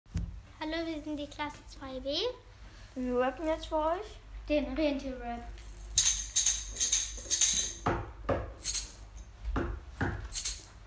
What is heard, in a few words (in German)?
Klasse Rentier Rap